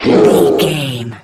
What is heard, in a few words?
troll monster attack slow